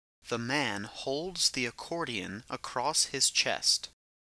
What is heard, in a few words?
accordion